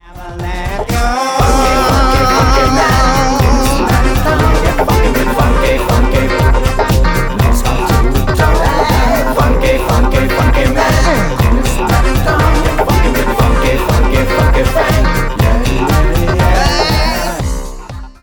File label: Funky Man